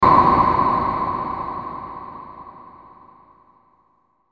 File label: Blast Reverb Airy